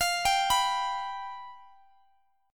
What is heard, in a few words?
F Suspended 2nd Flat 5th, F, Guitar, Standard; Fsus2b5; arpeggiated; down; capo=3